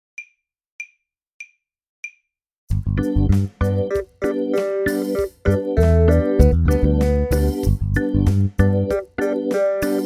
basson

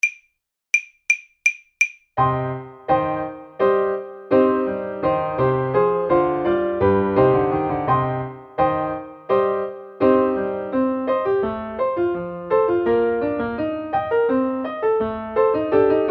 badinerie courte, saxophone

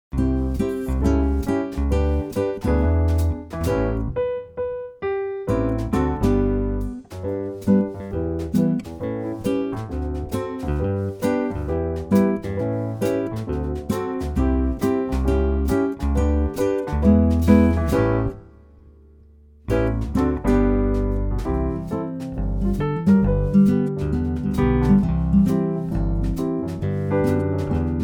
basson

